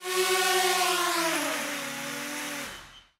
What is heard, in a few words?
drone shutdown, vehicles engine